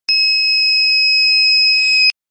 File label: beeping computer